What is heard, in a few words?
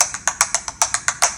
tap; mikotoba